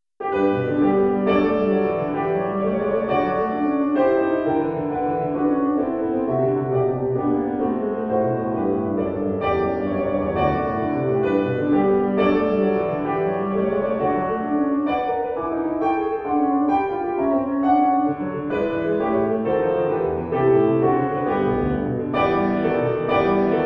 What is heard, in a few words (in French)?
etude pour piano; Johann Baptist Cramer